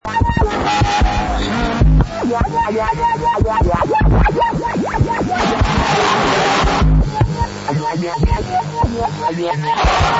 Surface Noise

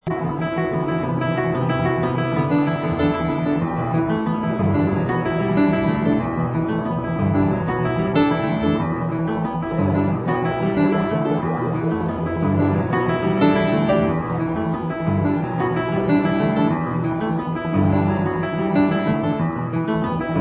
Piano Works

